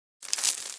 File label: Debris Dust Dirt Bump; blindside